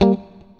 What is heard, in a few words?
STRAT, GUITARS; Synclavier Sampler Library